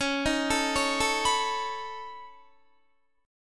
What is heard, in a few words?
Db 7th Suspended 2nd Sharp 5th Db down Guitar; Db7sus2#5 arpeggiated; JGuitar